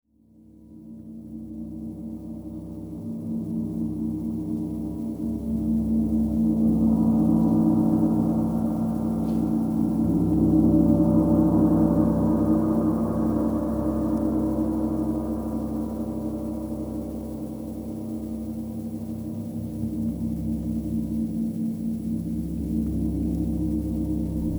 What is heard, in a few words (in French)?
immersion sonore gong, chant du gong; LE SOIN PAR LA MUSIQUE